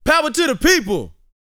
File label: VOCAL F; ILIO